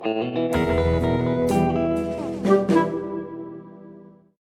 Workshop Area Theme

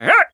Wizard Jump

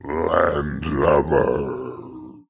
Pirate Groan

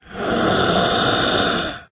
Lost City Groan

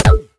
peashooter pea cannon; cowboy

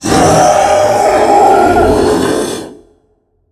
Streaming Waves

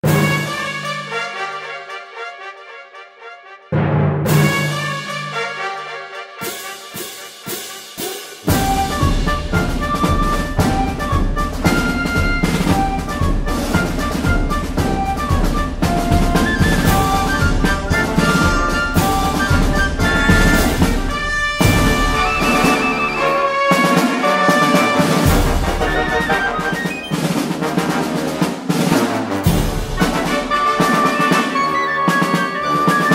March

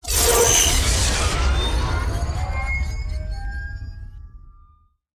portal opening

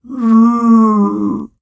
Dino Groan

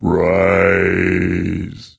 Lost City Groan

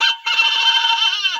Wizard Mini Laugh

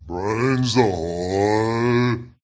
Pirate Groan

